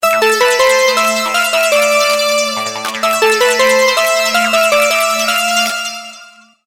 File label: Sms Melody